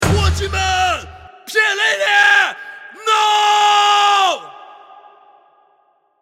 start action, music

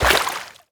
water entry; player

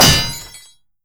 padlock destroy; locks; Half Life Alyx

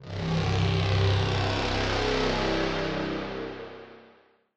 cable pulse, music; c17; citadel

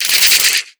attack tell, antlion; creatures; vox

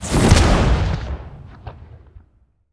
gas can hit; Counter Strike Model Pack; CS:GO; survival

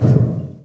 metal grate impact soft; physics

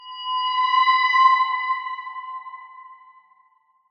ambient abstract metal evo; Half Life Model Pack

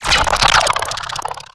bolt huge zap

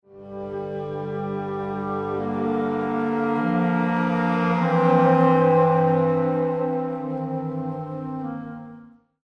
lost round, music; halo

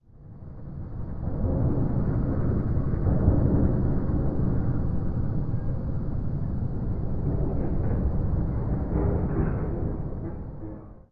ramproom, ambient; nuke

